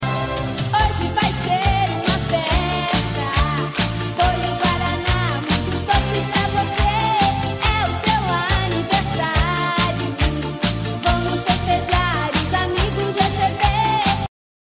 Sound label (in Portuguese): infantil